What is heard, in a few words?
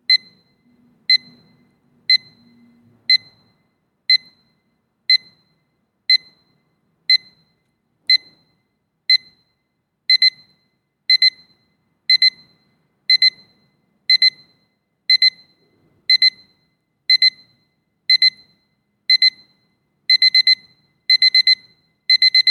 sample of the alarm sound